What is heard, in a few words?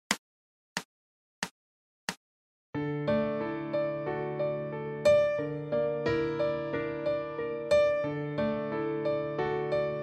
Piano